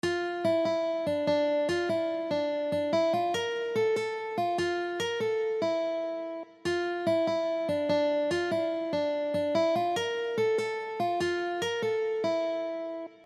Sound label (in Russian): na 1 strune